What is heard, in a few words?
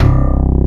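D50 synth bass; East West; Global Sounds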